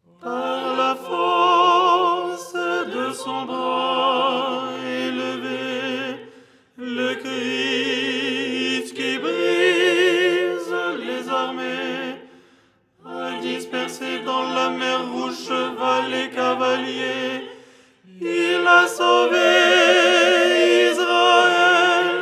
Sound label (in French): tenor; 1er hirmos du canon du dimanche; Les 8 tons en français